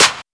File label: D&B Clap, Drums Hits Claps; neuro